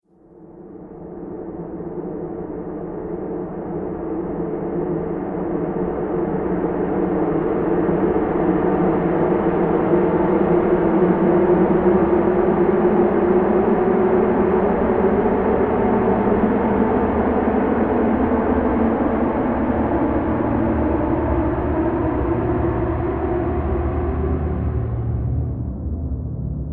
Death Awaits Transition, Scary Soundscape

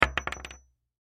Bullet Shell; Bullet Shell 38 Special Drop Wood; Drops (Sound Effects:Objects); Blastwave; Warfare

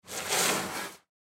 Gun Powder Bag Pick Up; Gun Shot; Warfare; Gun Shots (Sound Effects:Guns); Blastwave

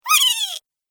Gremlin Weeee, Monster Sound Effects; horror sound effects

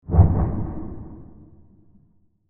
Deep Fast Misc Transition; Scary Soundscape; Horror

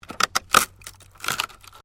WOOD PLANK SPLIT

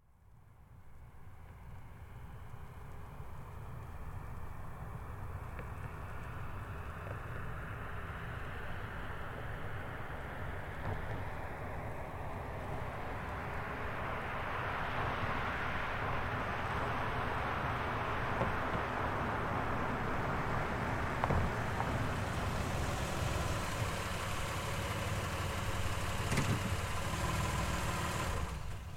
Car Highlander Up Stop Slow; 2005 Toyota Highlander V6; Cars; Vehicles

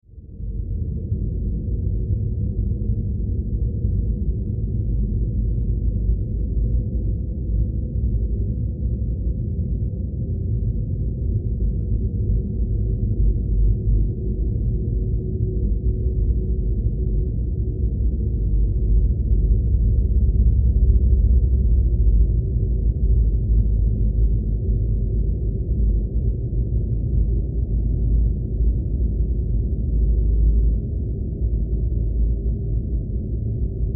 Horror Drone Deep Rumble Wind; Evil (Sound Effects:Horror)

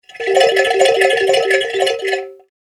Rusty Old Music Box, toy melody; Andrew Fleming

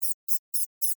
Mouse Squeak, Animal, Mouse, Squeak, Rodent; Miscellaneous Animal; BlastWave

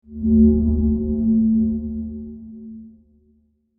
Fog Horn Distant; Transportation; Boats; Vehicles

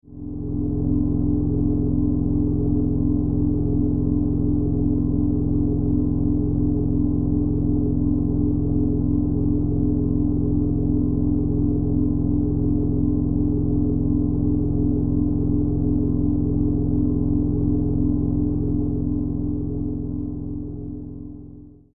Science Fiction Power Drone; Drones; Sound Design

